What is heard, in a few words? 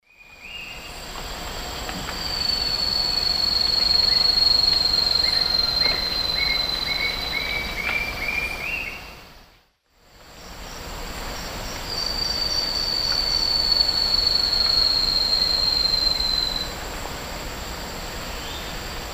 Malacoptila fusca, song; S. America; Eastern Lowlands